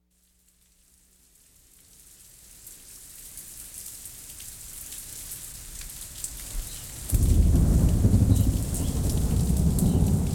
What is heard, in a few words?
storm